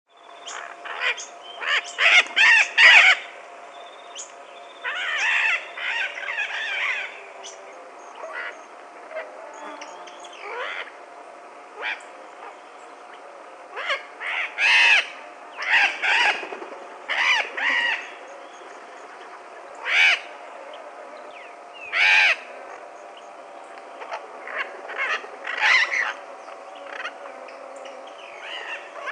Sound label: Primolius auricollis; Punto Alto